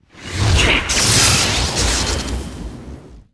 Old Fox Atk

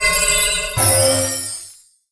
heal

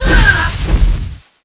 damage; IFocalor; Seer; GamigoNA

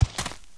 Red Condor walk

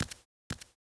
Walk

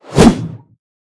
Dark Fog Attack; Seer; GamigoNA

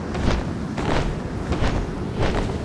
walk; Seer; GamigoNA

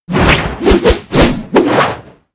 Yeti Skill

